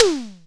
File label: TOM, KIT Dance Kit, Roland; 90 s Sample CDs; Sound & Vision - Gigapack I CD 1; Dance-Kit 28